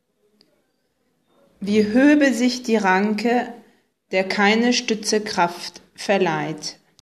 chorale

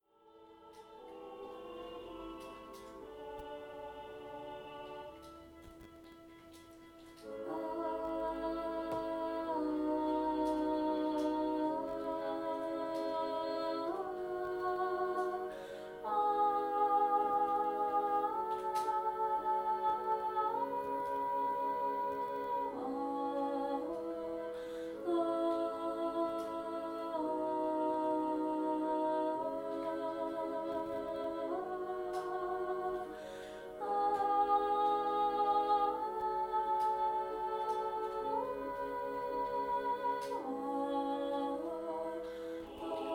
Intermede soprano

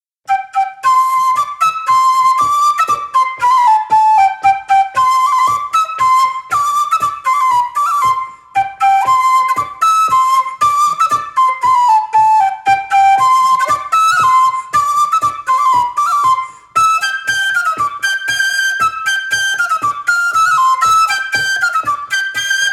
Polka; Polka de Chanteix

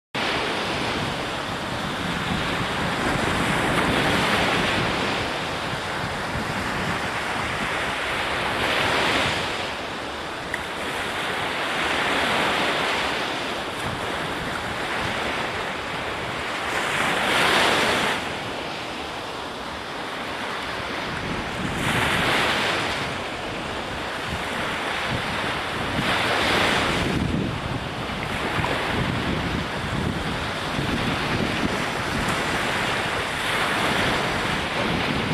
Bruit de la mer et des vagues ambiance

